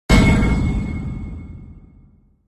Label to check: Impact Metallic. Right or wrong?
Right